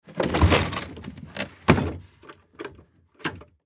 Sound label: Wood Crate Movement; Factory; Industry; Blastwave